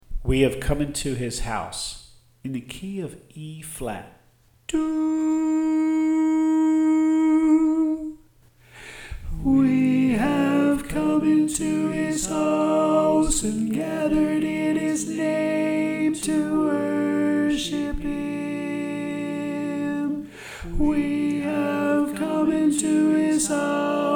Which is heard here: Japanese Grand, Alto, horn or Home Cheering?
Alto